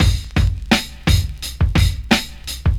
86 bpm 00s drum loop f sharp key, kick tuned to the F# note. Loudest frequency: 1296Hz